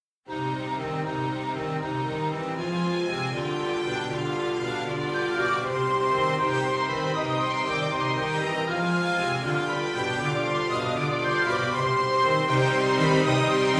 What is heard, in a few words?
orchestra; maoudamashii